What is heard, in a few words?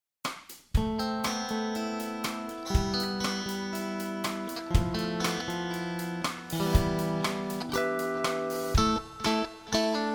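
loop in A minor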